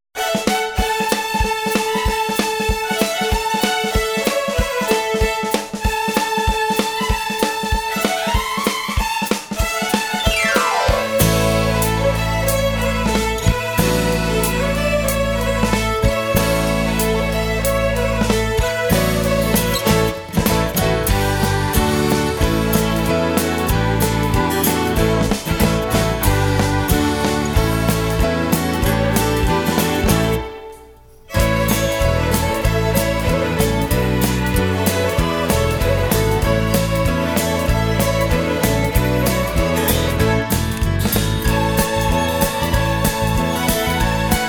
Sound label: Fiddlers; Bonapartes Retreat